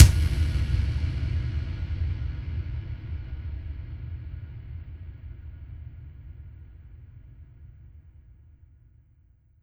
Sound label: FX Impact